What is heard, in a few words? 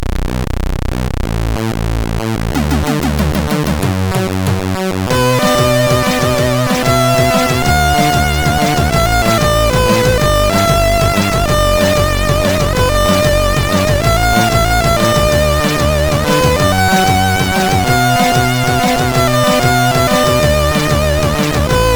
Jazz, sid, CSG8580R5